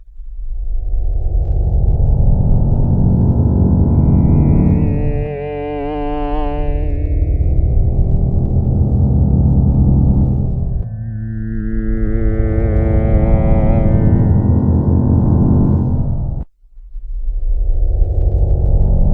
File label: pad dark voice, yamaha tx81z